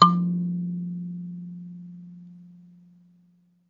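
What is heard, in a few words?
KALIMBA G2; Synclavier Percussion; World & Orchestral; ILIO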